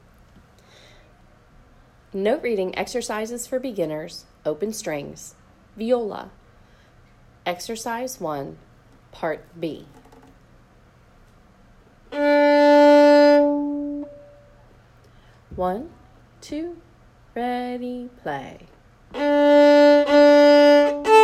note reading exercises for beginners open strings viola